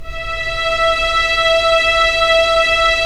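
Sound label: STR SC, string section; Roland - LA Composer vol. 1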